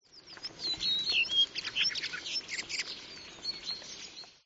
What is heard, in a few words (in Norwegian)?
stær